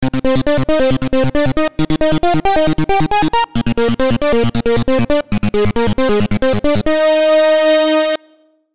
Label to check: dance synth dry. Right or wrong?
Right